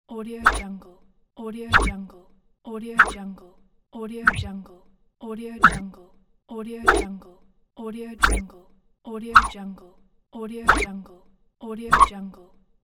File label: swoosh; volkovsound